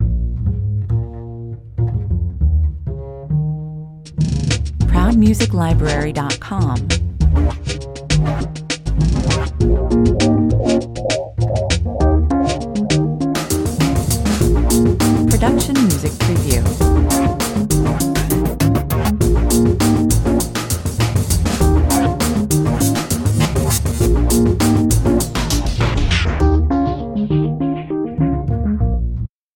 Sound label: Short, funky, retro urban style hip-hop, dirty funk, acid jazz. Upright bass, rhodes piano; lo-fi funk drums